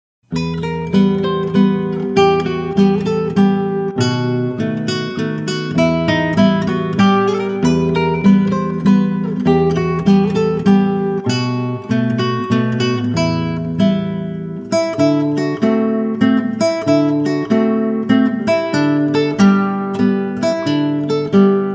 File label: MAZURKA